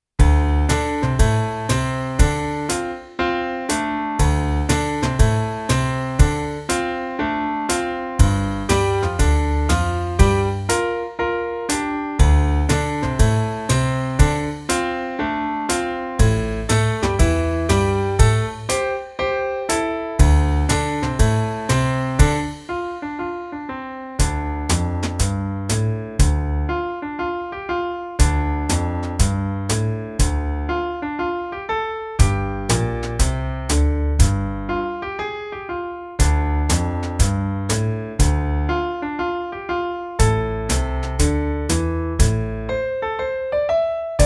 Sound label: Muskrat Blues